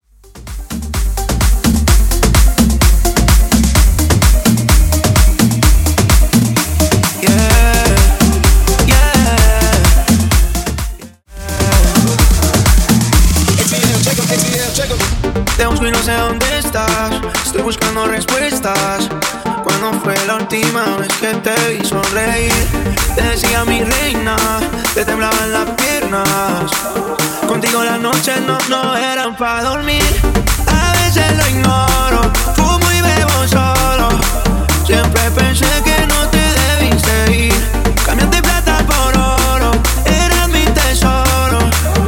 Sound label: 128 bpm